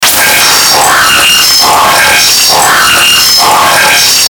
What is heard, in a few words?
Distortion Bright Harsh Digital; Blastwave